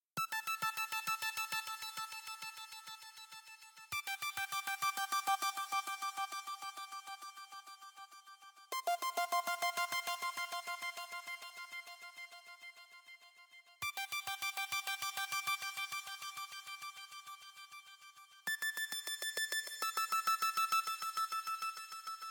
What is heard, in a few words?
Melody Synth